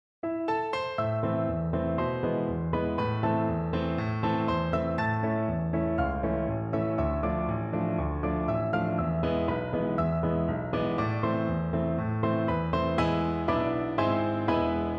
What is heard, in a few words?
Hevenu Shalom, piano duet